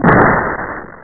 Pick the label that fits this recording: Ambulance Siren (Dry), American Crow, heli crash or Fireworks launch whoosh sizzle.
heli crash